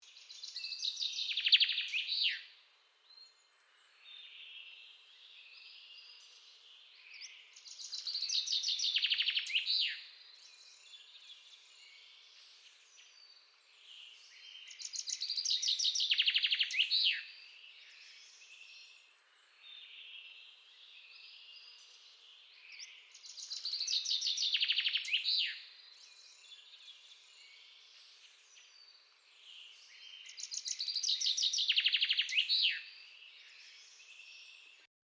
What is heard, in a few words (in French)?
Pinson des arbres